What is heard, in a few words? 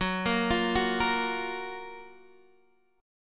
F sharp Major 7th, Guitar, DADGAD